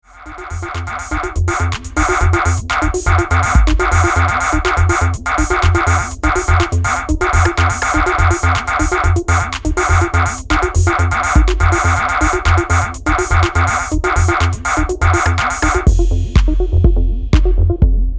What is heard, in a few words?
Acid Snowfall